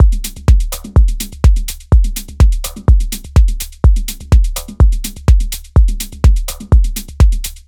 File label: Drum 125bpm Full